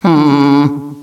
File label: hmmm, Echo inside caves, underground; DS; ML BOWSERS INSIDE; Luigi